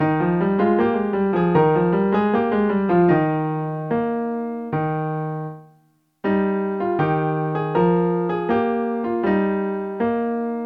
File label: dotted 8th note in E flat